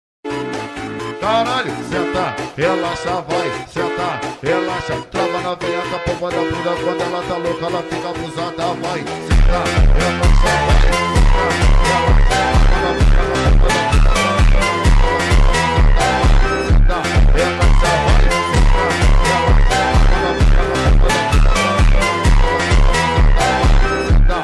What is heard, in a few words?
Phonk